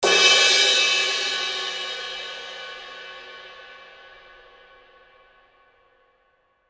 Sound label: cymbal crash